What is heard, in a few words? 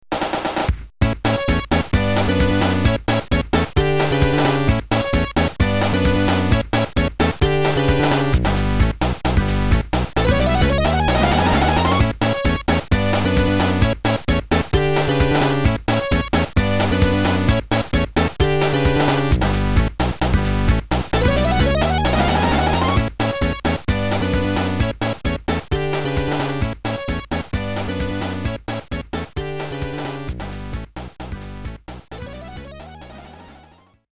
invincibility bgm; sonic1